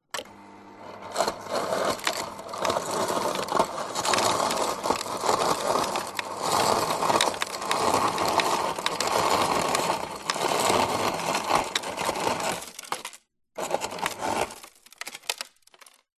household refrigerator ice dispenser serve crushed ice into glass; zapsplat